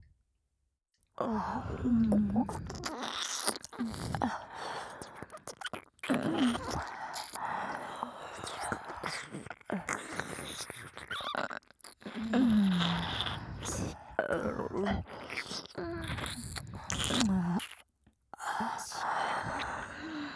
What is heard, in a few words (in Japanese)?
左右同時耳舐め手コキ